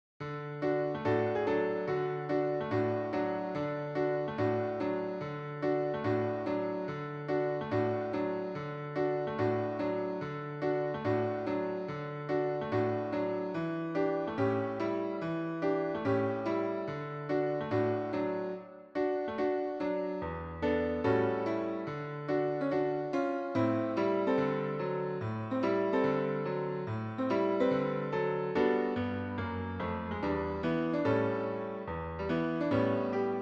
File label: Rock-a-My Soul PIANO